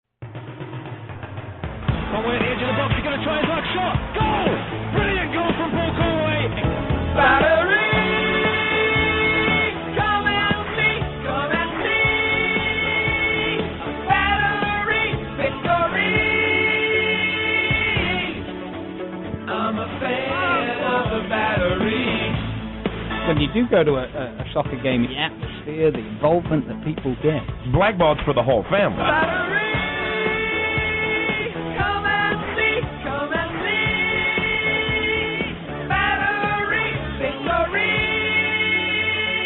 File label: Battery song